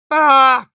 Spy negative vocalization, ru

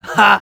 laugh short

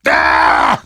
negative vocalization; Heavy

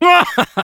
laugh short

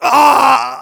pain severe

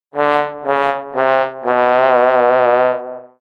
Trombone taunt

